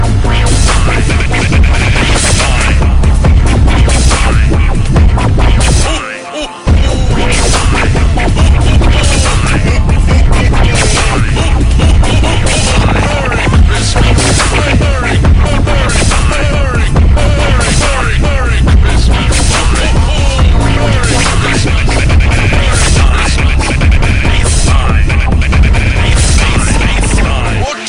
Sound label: dubstep xmas mix